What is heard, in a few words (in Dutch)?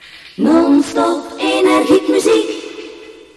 Jingle gezongen a-capella Non-Stop Energiek Muziek